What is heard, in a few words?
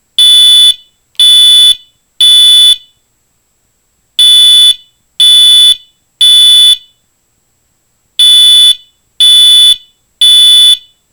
MT Code-3 Tone; Fire Alarms